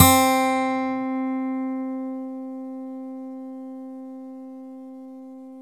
SLIPPICK, MORPHEUS

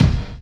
Kick, Kickdrum; Equipped Music; Loungin House

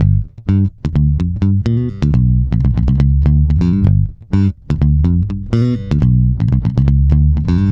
BOOG G, Bass, BOOGALOO; Spectrasonics; Bass Legends

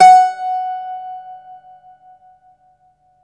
IRISH BAZOUKI, Plucked Strings; E-MU Producer Series Vol. 7 – Old World Instruments

